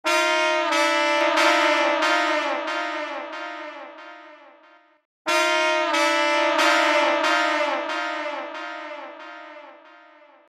brass

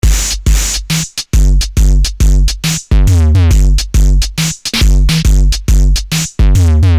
beat 138

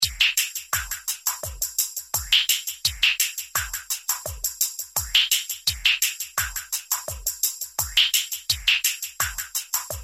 dru

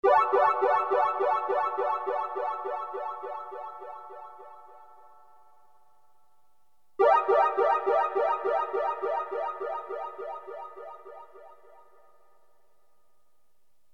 arpeggio 138

